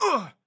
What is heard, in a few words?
town human road hit; Blind Drive